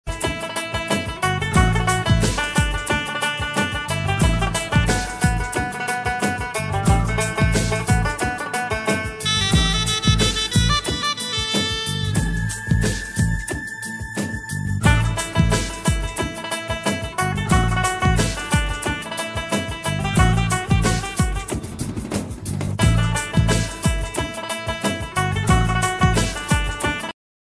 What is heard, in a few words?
song bgm tamil